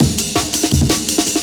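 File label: breaks gated, JUNGLE; AMEN; M8JUNGLEPAK1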